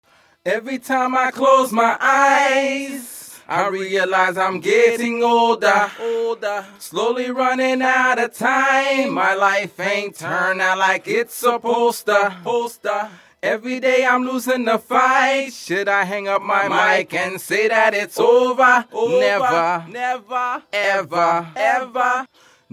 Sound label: rap sing 148 Fm